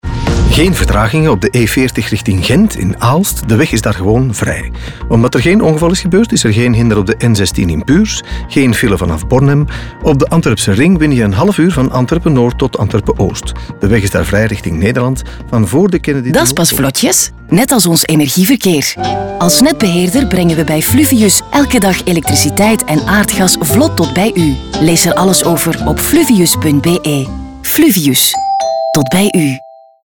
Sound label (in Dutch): Radio NL Verkeersinfo Muziek Jingle Bell; 30s; Fluvius